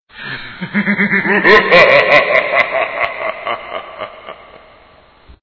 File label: horror sms tone ringtone